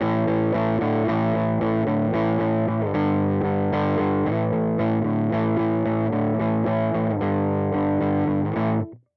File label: Overdrive Stacking Overdrive x 2 Circuit B